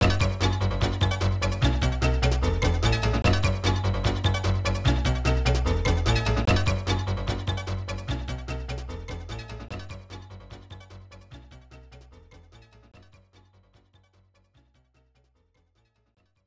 Main BGM Normal; Vs. Super Captain Flag

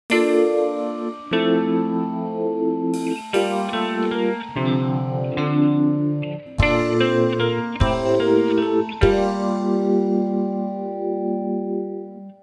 Sleep; BECK The Game

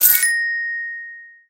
double coins on

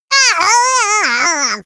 Classic Hit Sound; Jolly Battle

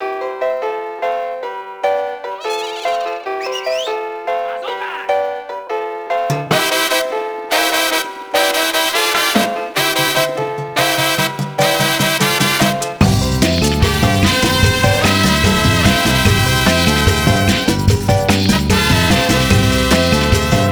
Cuban; Cuban Layer4alt1; Happy Feet Two: The Videogame; PS3

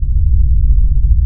Loop Ambient Concrete

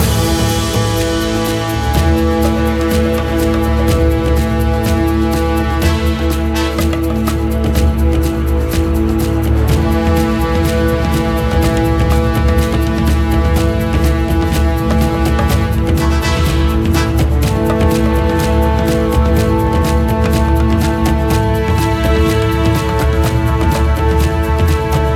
TENSION LOOPABLE

